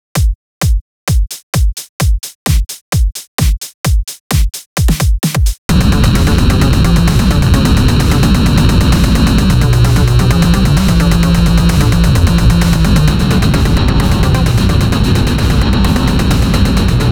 Dubstep; Parody Video

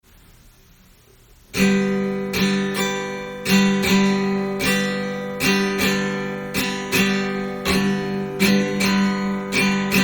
Acoustic Interlude

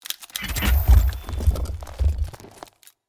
Monster; Zenless Zone Zero

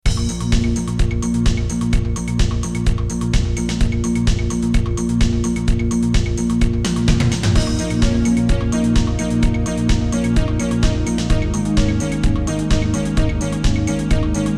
space synth fragment

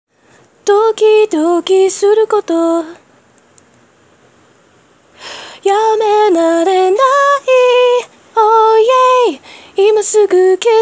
Singing the chorus [Japanese][Singing][Short]; F4A

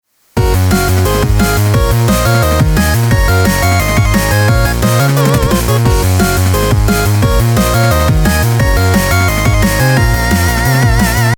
dnb version

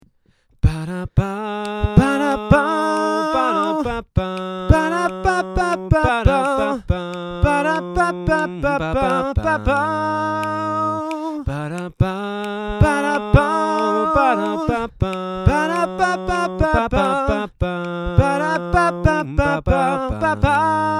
a cappella just the horn bit

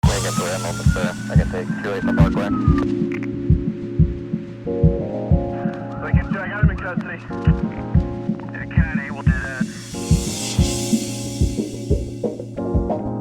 Funky Criminal Groove

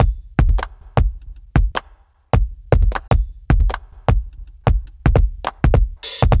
drum; Pony Fan Music Remix Stems

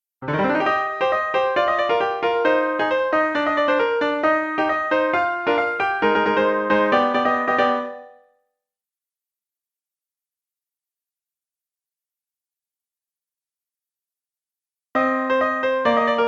piano

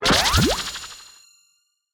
Monster; Zenless Zone Zero

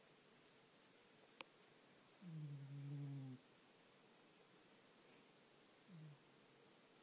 snores

